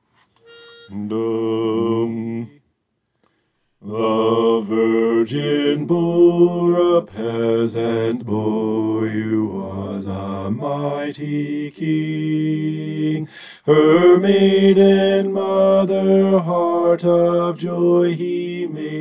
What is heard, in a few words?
bass